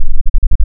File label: FM64 Wave DX11; logue-sdk